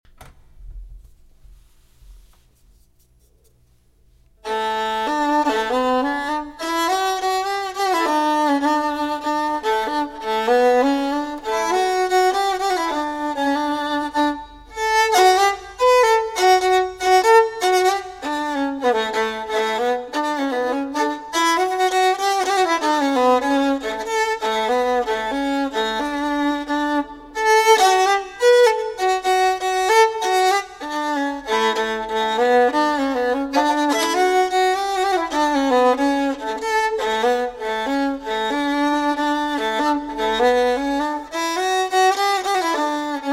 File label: fiddle; world music collection